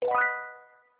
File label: retry success, Sony Ericsson; Z555i